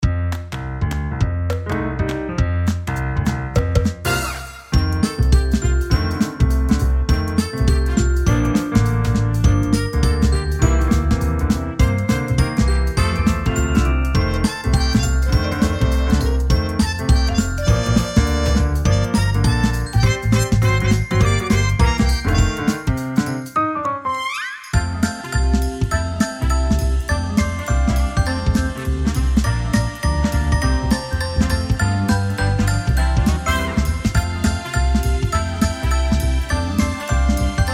Jingle Bell Swings; Game of Dice; Christmas

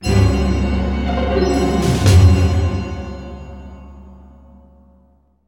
Opening (Stinger)

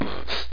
Voice Beat Box; Scratch Game Maker

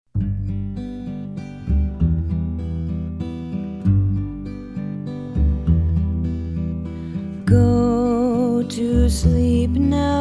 Lullaby; Nick Jr Radio

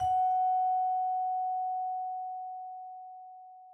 Scale; Second Scale6; tintin reporter cigars of the pharaoh

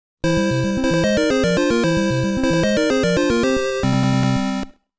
Triforce Get Fanfare; the legend of zelda 2 link no bouken; fds

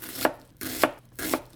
Knife Chopping; OneShot The Pancake Episode

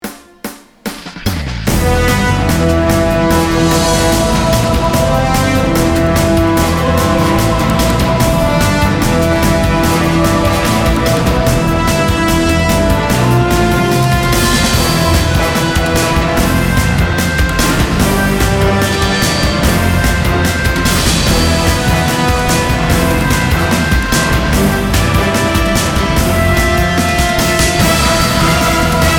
Battle Em 147bpm; disney infinity 2.0 marvel super heroes gamerip

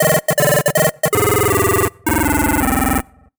MUSIC EFFECT Solo Chip Square Negative stereo; Rollerforce

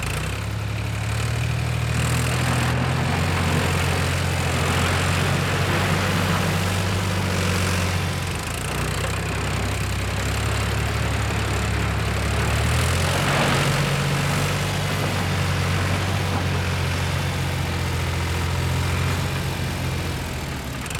vehicle excavator drive

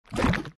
Ink Jump; Fancy Pants World 1 Remix

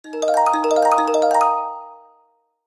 success; Piloteer

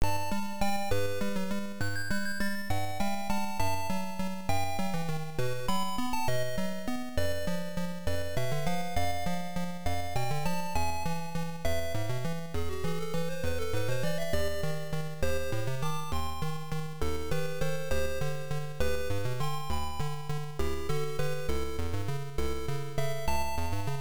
Sea BGM; Shalom; msx

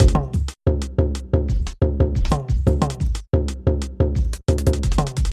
Ethnova

